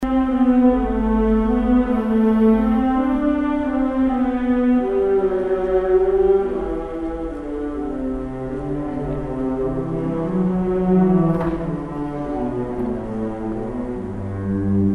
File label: Playing Cello Concerto in E minor, Op. 85; Edward Elgar